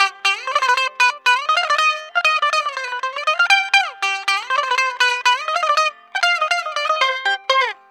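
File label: electric bouzouki; EL.BOU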